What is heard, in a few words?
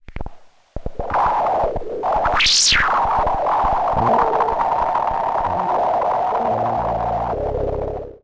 WEIRD Blubby Chomper